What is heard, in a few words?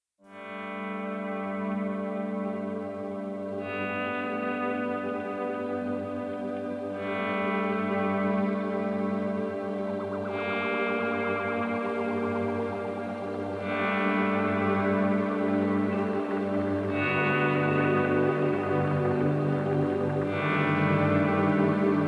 Rising Stars Main Full 144BPM